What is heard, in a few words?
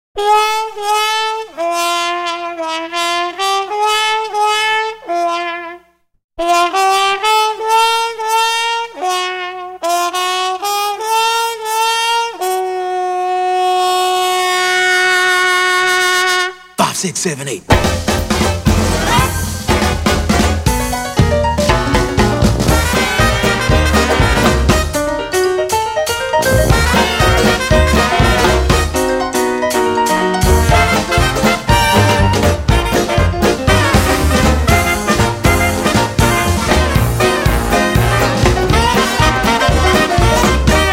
Jazz; Demo Procesador AudiMax 362 HD